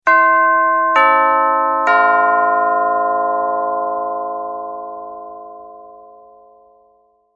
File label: doorbell sound tubular chimes